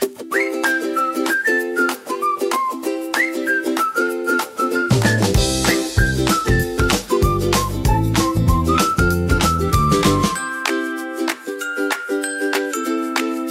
Sunrise Sidewalk Parade, hand wraps whistles

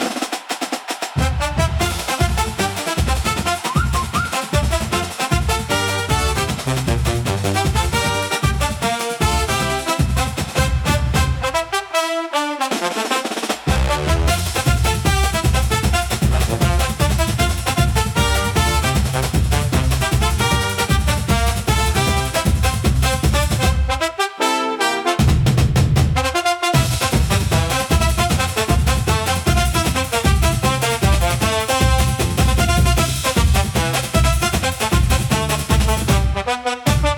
Parade of Brass, upbeat carnival; for festivals and parades

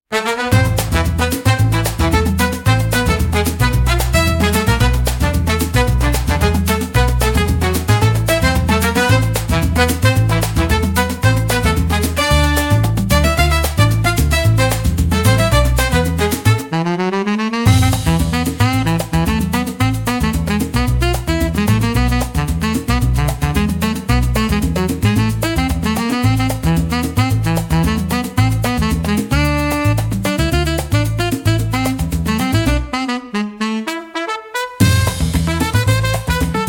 Marcha do Samba, upbeat carnival; for festivals and parades

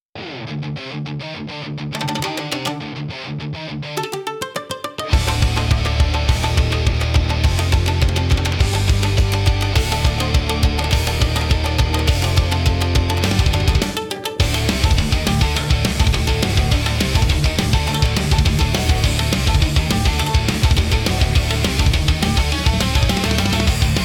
Splintered Strings, intense japanese rock

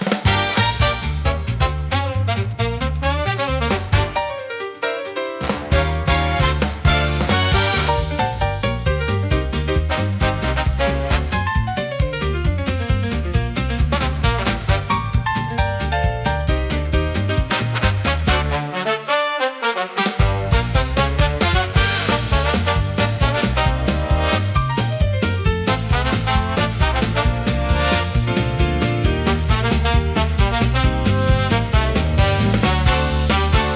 smooth jazz lounge for urban and classy; cafes

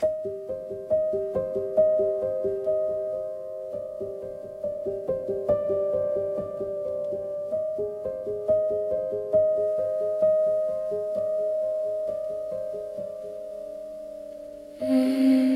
spiritual spooky soundscapes

